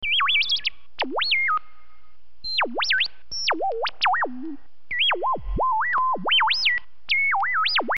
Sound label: Scifi Tone